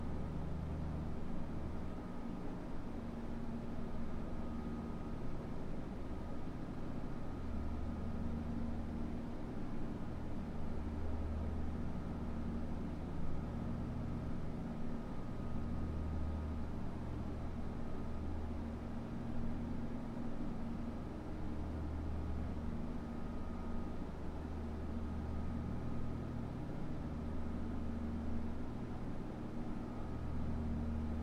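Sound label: distinct dull long sound of factory work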